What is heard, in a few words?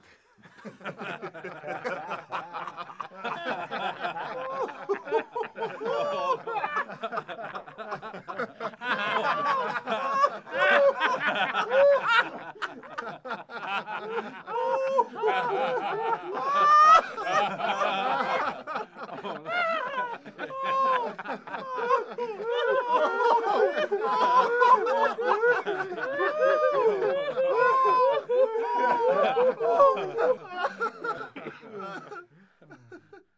Laughing Small Male Crowd; Sony Pictures Sound Effects Series; Vocals & Wallas